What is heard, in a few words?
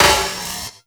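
snare drum, drum & bass, 150 BPM; Ueberschall; Drum & Bass Resonance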